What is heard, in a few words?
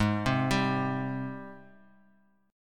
G sharp Major 7th; arpeggiated; chord; x,11,10,12